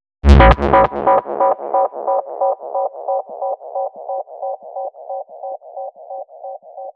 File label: Bpm135 Floating Fx Loop Db, Dubstep; FX Loops